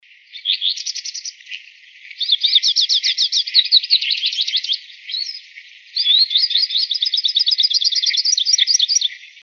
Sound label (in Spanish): Cabecita negra, Pintassilgo (Spinus magellanicus)